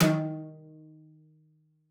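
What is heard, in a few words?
CELLO spiccato D#3; 90 s Sample CDs; Xsample VOL-5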